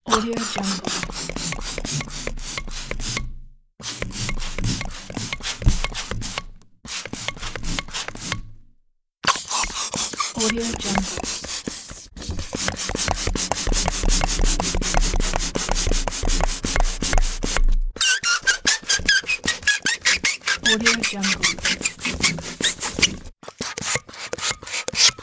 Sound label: balloon pumping